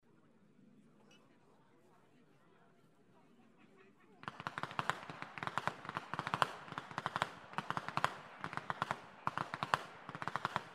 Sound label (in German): schnalzen, flachgau; BERGHEIM III